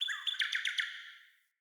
Cardinal Male Bird Song

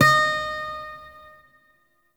Shawzin Oct Three D Sharp; Warframe; Lotus Shawzin

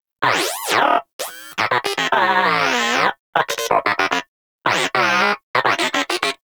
Moa Inquisitive Vocal Interact; Pets; Warframe

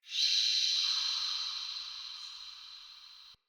Day Texture, Ambience

